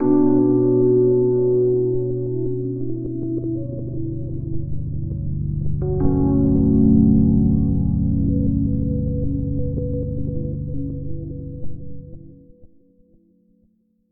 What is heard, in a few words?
Sad sting, soundtrack; minecraft story mode gamerip 2016; A Block and a Hard Place